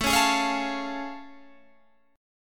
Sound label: A Augmented Major 7th A Guitar Open G